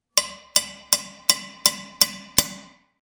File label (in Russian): zvuk udara po metallu